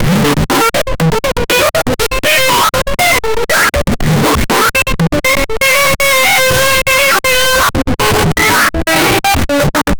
scooter

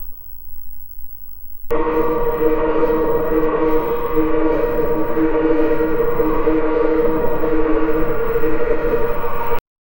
surveillance tower in the

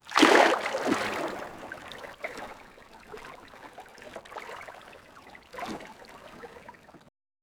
the sound of a large splash in a calm lake; serene

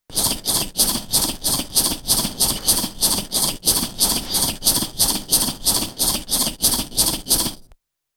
witch fun sound; fantasy

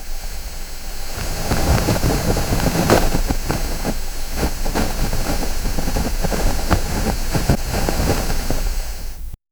cloth is magically formin

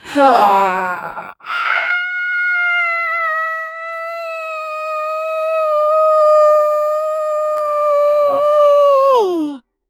raw open mouth female voc; violence

